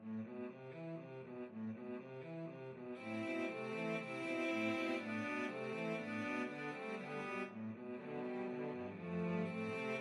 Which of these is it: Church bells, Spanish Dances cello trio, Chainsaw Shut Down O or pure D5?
Spanish Dances cello trio